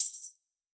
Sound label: tambourine, electronic